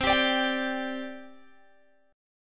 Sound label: C Suspended 2nd C Guitar Open G; down; chord shape